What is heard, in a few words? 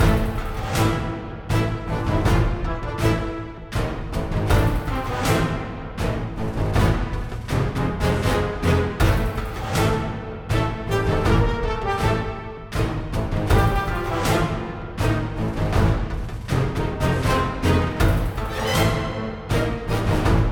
March; Shadow Bug